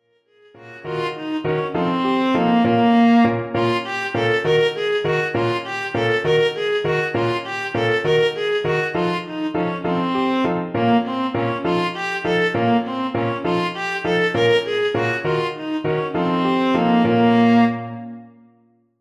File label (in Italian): saltarello